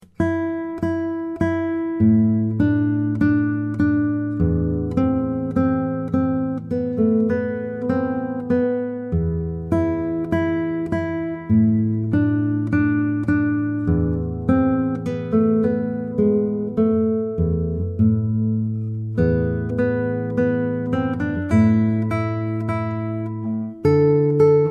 Coccinelle, guitare solo; Oeuvre pour guitare solo; Editions Pierre Lafitan

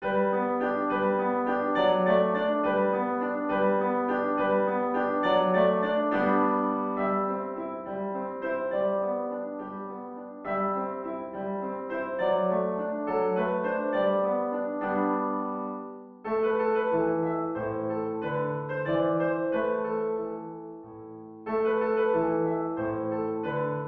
piano

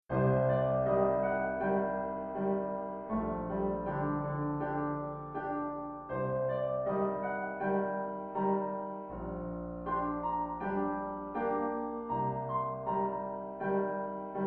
piano

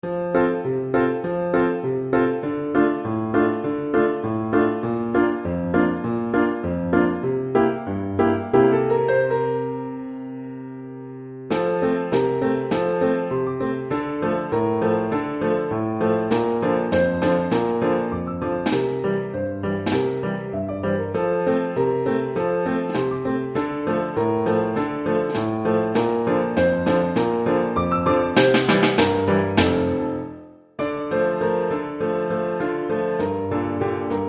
pour caisse claire et piano; percussions

